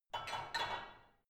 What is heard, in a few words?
barbell only replace soft